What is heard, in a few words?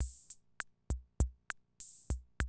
CR78, DrumLoops